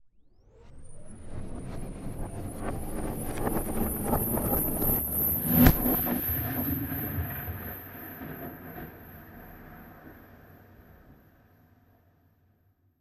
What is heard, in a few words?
Trailer Hit